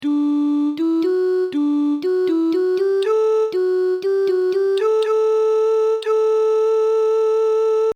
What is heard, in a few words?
Soprano; Allelulia